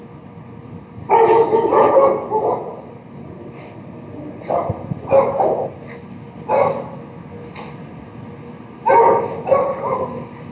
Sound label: bark samples